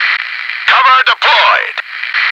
noice

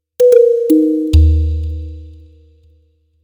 Lose Fanfare

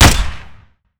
machinegun fire; Shattered Horizon

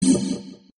tele sound; Arc of Templar

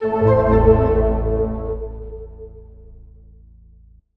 Get Hint; escape academy

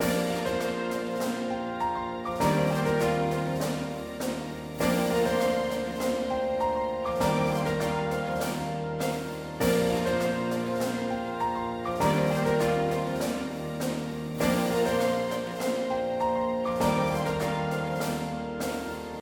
Racing Result Fanfare; horse tales emerald valley ranch

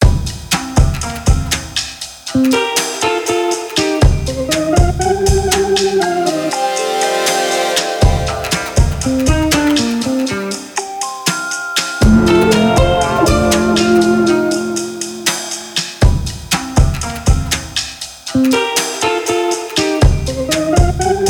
Chill Out

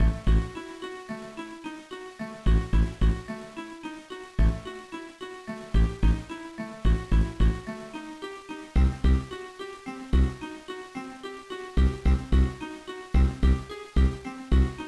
BGM; V-Master Cross; GBA

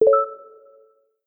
Time Boost; Welcome to the Game II

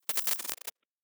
Glitch

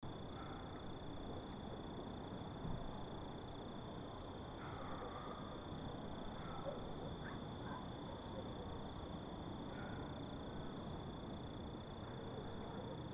Neighborhood Ambience Night

